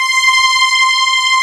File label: Textural Strings; E-MU Producer Series Vol. 2 – More Studio Essentials; Composer